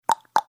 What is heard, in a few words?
tongue clicking